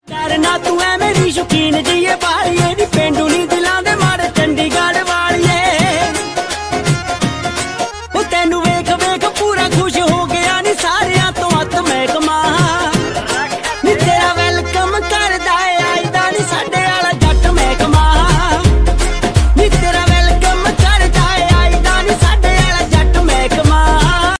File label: DJ Punjabi Ringtone